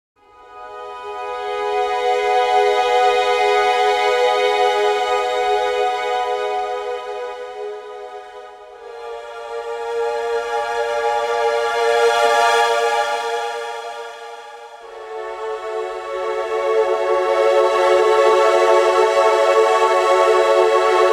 Con Sordino Strings 3 Articulations Dynamics Expression wet; Orchestral Tools Berlin